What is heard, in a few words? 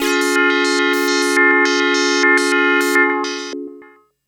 Obi Major Chord; Electronic Point Blank